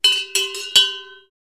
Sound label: Cattle wearing bells moving around